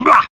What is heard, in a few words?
rah; ooh ahh; skeletor